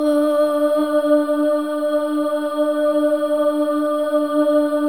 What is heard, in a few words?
SOLO BOY, AH; Spectrasonics; Symphony of Voices